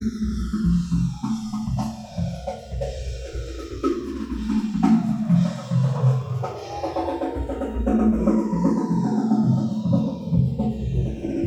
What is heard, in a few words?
Drumset